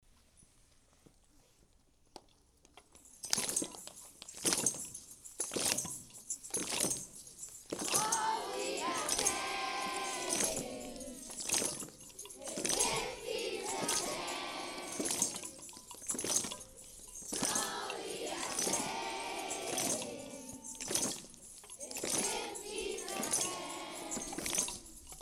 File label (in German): Frieden Gesangsaktion; FRS 2022